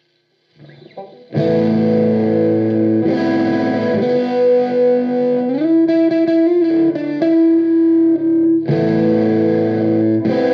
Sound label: drive high